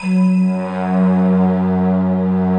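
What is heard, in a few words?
F#2, GLOCKENSPIEL FLUTE; Best Service; Advanced Media Trax Modern Composer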